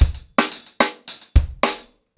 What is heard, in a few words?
110BPM, ACOUSTIC DRUMS